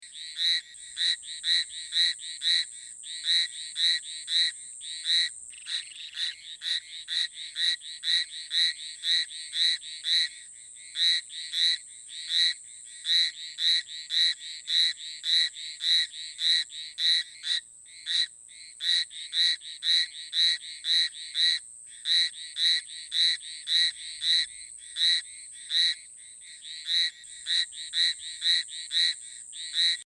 Hyla Hallowellii Is Calling

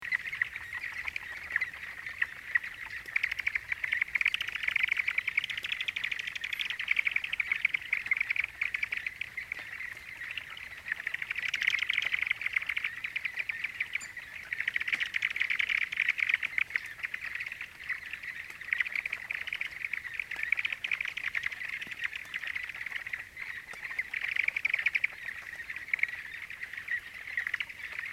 Rana Ulma Is Calling

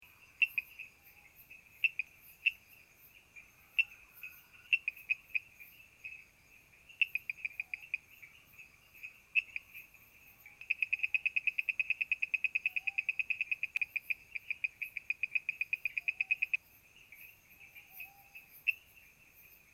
Kurixalus Eiffingeri Is Calling

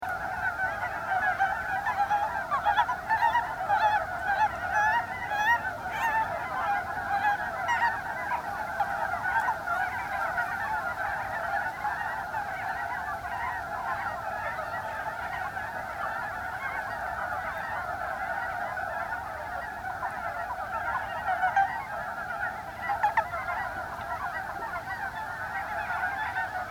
Rana Ornativentris Is Calling

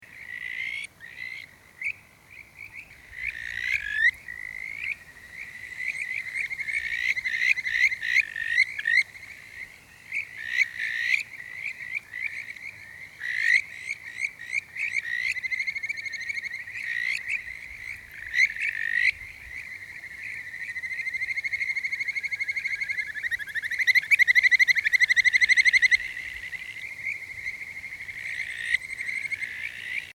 Buergeria Buergeri Is Calling

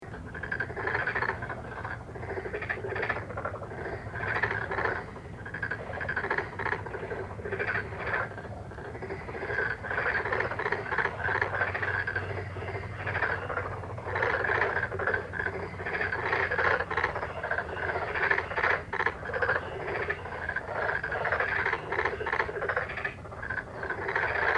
Pelophylax Nigromaculatus Is Calling

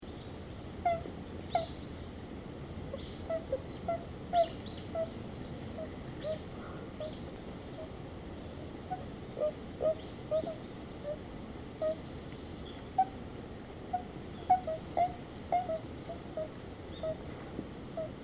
Bufo Japonicus japonicus Is Calling

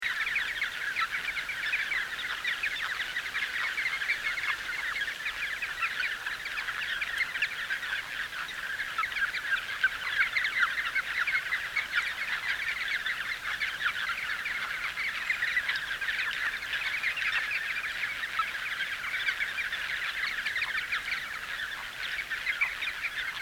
Rana Tsushimensis Is Calling

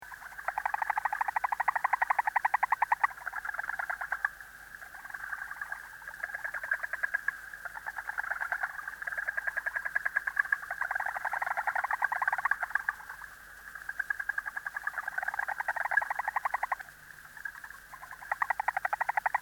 Rana Japnica Is Calling

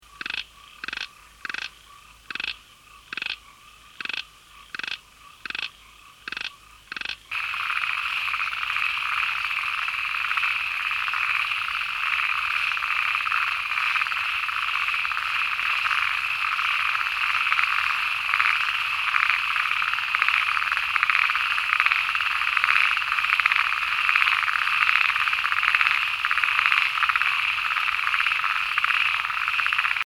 Microhyla Okinavensis Is Calling

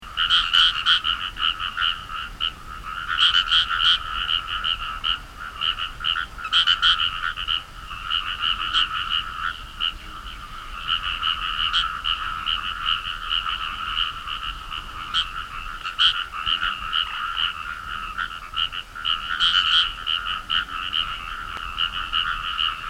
Fejervarya Kawamurai Is Calling

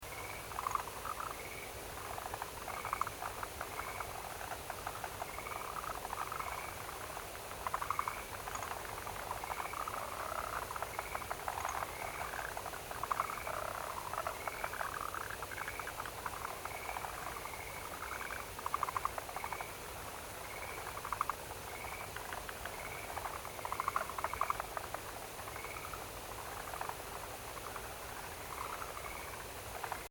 Rhacophorus Arboreus Is Calling

